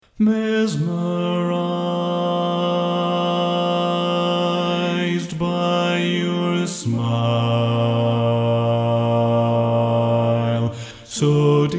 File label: Bass, barbershop tags